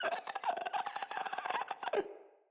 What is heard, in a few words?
Infested Runner Patrol